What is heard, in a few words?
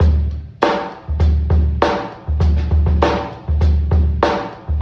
eye opener, Drum loops Boombap